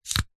household radio aerial telescopic push down or pull out; zapsplat